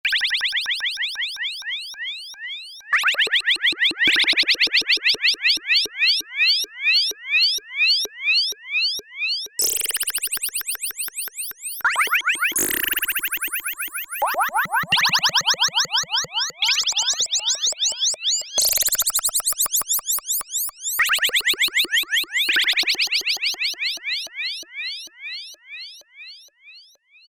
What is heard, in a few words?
BIRDS, Oberheim OB-8; Factory Patches